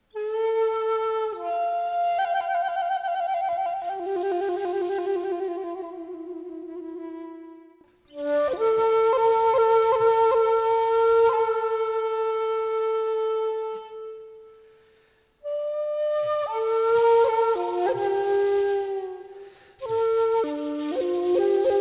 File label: shaku d